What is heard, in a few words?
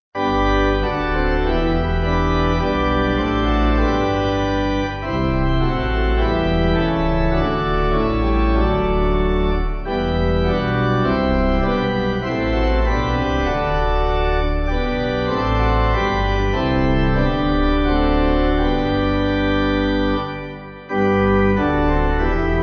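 Pipe, hymn backing; Vienna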